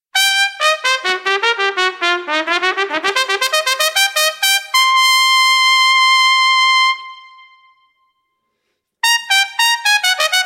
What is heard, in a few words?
dixie quartett